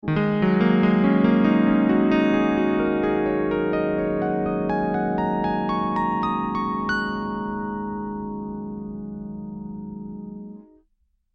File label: cp80 up sustain